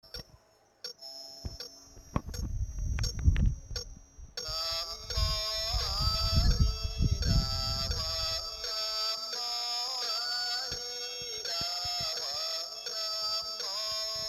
distorted chant again, Vietnam; 2019

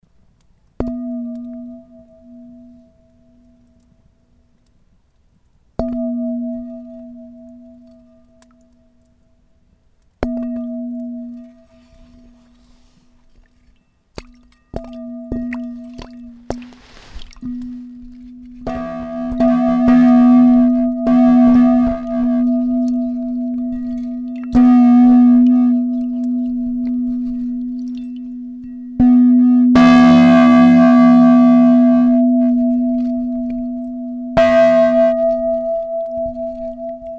copper fire gong; Gamelan; Gamelan Raze

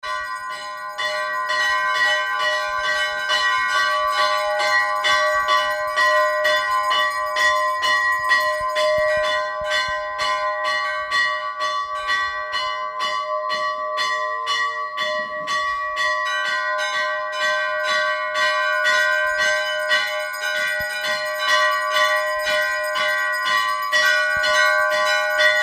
Bells, North India

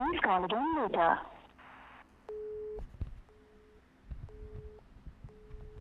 phone, South India street recordings

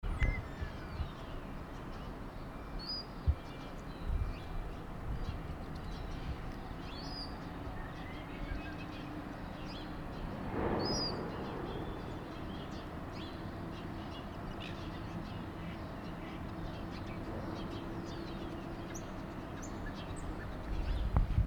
ambience docks port chalmers

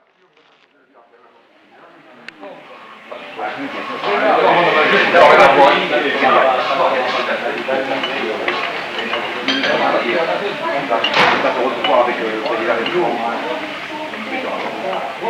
shrine dictorphone mexico, street recording

